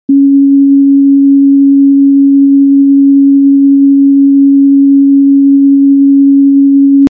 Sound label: c sharp 277